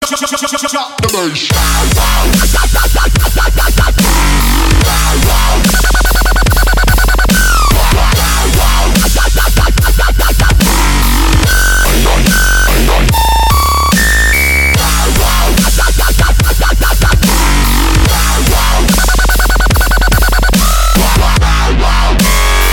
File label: remix music tone